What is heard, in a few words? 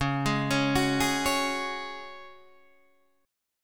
Db Suspended 4th, Guitar, Standard; 9,11,11,11,9,9; arpeggiated